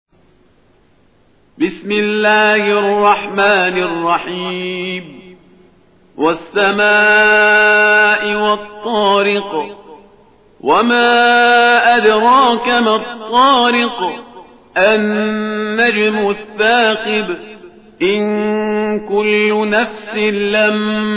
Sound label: quran, Altareq